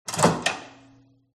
open microwave; fox audio

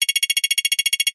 multimedia game sound toggle

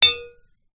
impact metal dowel thin on concrete post

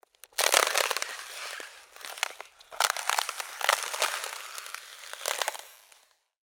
nature ice crack

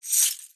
foley keys large bunch prison guard grasp in hand

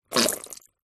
human spit water into bowl

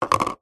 foley tub small thick plastic set down table

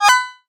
multimedia rollover; sound effects one

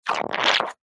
foley liquid foamy frothy short suck

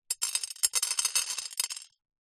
household pills tablets handful drop on glass; zapsplat

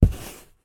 wood hardboard thin down on carpet lay on edge

